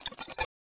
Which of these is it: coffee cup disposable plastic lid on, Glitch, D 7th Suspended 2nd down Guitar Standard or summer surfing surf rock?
Glitch